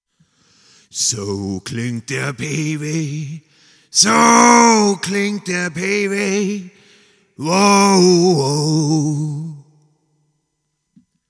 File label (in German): Hall hoch